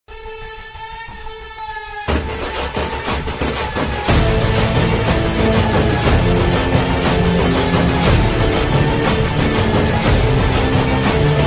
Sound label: drum bgm; kgf climax killing scene